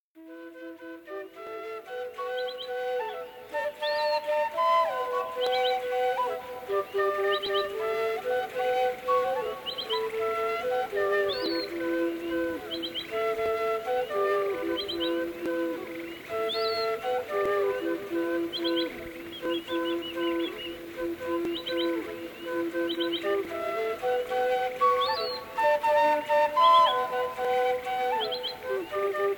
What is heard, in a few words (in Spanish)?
Duo de Quenachos